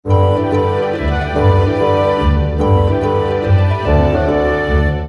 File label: Loop; Heroes WoG